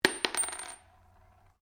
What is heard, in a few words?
Cartridge 38 super impact polished wood, shell casings; shell casings of different calibers impacting on different surfaces